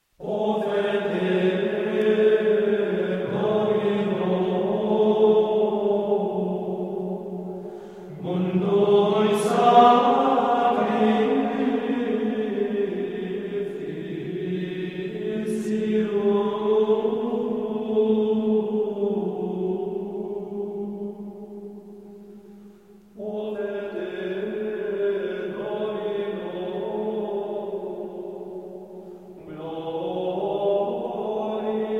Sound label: Coro de Monjes Altspanische Gesänge Sacrificium Offerte Domino, Gregorian chant